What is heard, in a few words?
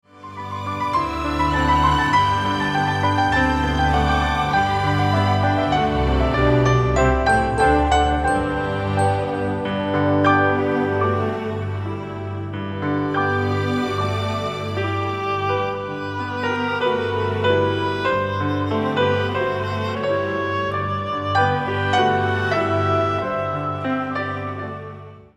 Christmas Bells